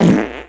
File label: fart